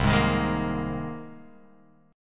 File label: C Minor 7th Sharp 5th Guitar Open F; strummed; JGuitar